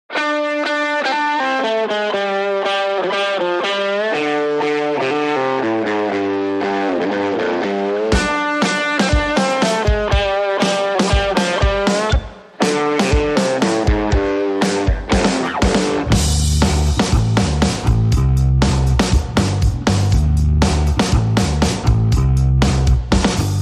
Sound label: No Rhythm Guitar